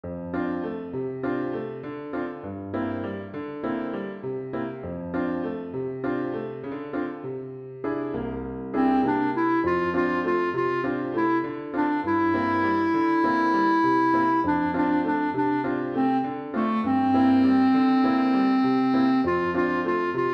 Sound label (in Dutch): met piano en klarinet